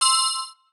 pickup gem bells; Parking Boom

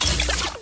enemy hit; Adventure Time - Angry Betty; ThrillGames

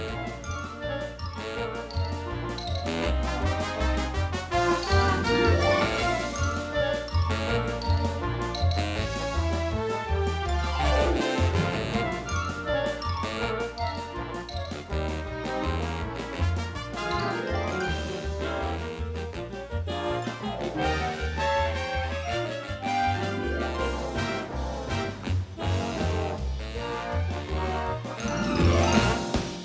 music menu loop; Teen Titans Go! - Raven's Rainbow Dreams

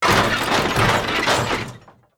crush cars; Nickelodeon - Destruction Truck Derby

